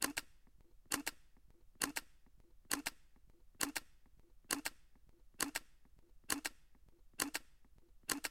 Canon EOS M100

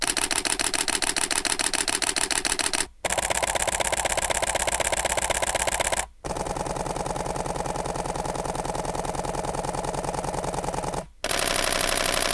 Canon EOS 70D 7D 7D II 1D X Burst

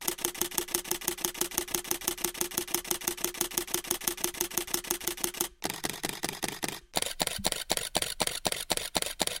Canon EOS Rebel T7i SL2 SL1

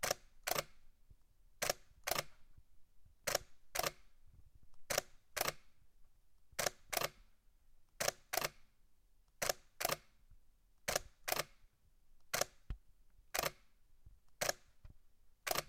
Canon EOS 1D X Mark II Silent

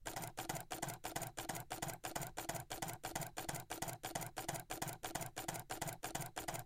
Canon EOS 5D Mark III Silent Burst

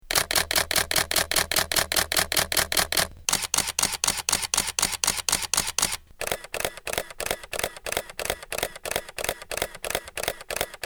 Canon EOS 1DsIII 1DsII 5D Burst

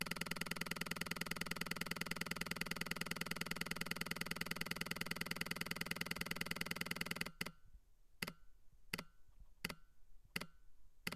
Sony a1 Burst

